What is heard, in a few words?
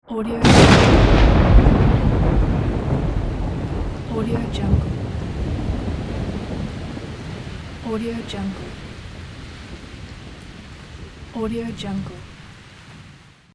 thunder